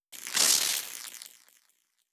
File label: Air